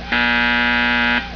buzz

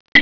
chain tick

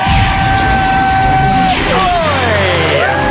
no deal; australia

